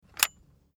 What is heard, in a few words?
scabbard